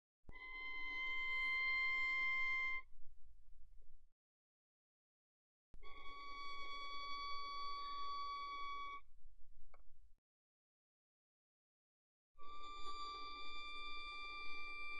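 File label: Violin arco pianissimo sul D C6 D6; Strings; violin2012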